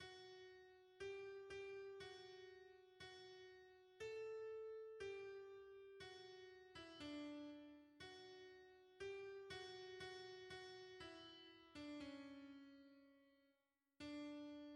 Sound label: alto, hymns; Deja pour nous a lui laurore